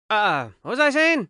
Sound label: cough; battleblock theater; narrator voices; puppetshow BOSS